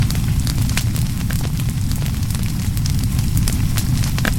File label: Fire Huge LOOP; Puddle